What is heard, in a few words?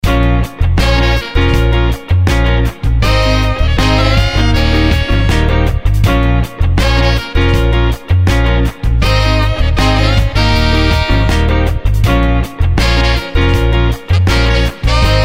Tripple sax